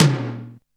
R8 Tom, Roland R-8

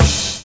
RIDE FILL; Univers Sons

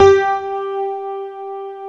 GRAND PF G 3, AKAI S-Series; AKAI S-Series CD-ROM Sound Library VOL-7; D.GRAND PF

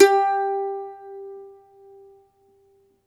MANDOLIN, Plucked Strings; E-MU Producer Series Vol. 7 – Old World Instruments

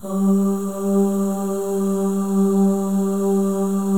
OH, FEM, Symphony of Voices; Spectrasonics

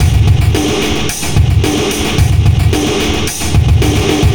Noise Beat; Drums & Drones

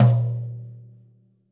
floor tom - snares on; JazzFunkKit

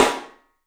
PIC SNR, PICOLO ROOM; 3D Sound

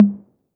Roland808 HT; Drums; Tr-808

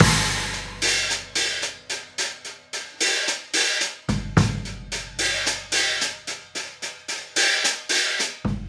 Hi Hat and Kick

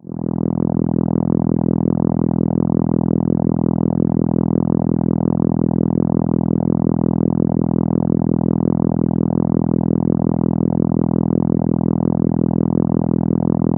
Contra E3, ARP Solina

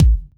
Kick, Kickdrum; Equipped Music; Loungin House

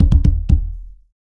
BED DUNDUN, 126; ILIO; Ethno Techno; BEDROCK

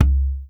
WD R, DRM HITS; Spectrasonics; Liquid Grooves; WAVDRM HITS1

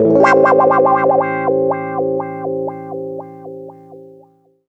120 WAH PAD, Electric & Acoustic Guitar Loops

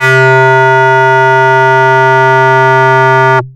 syn, Techno; single-sounds; single-synth; Best Service ProSamples vol.45; Techno ID

